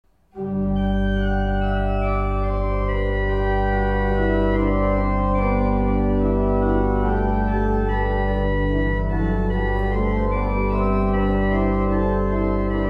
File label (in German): vergleich pitch